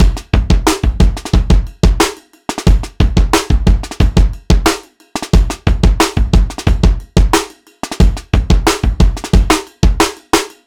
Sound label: Short Heavy Ride